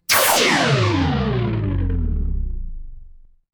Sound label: magic scifi laser